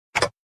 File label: tock